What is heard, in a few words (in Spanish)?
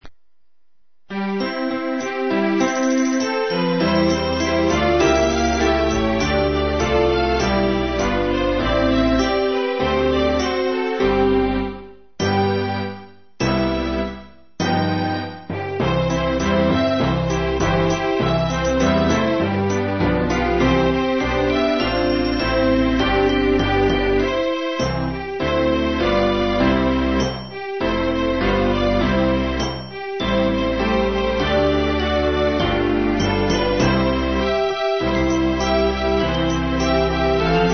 orquesta de cuerda